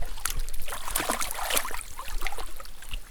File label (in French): Aquatic pateaugeage NUIT; Bruitages; Eaux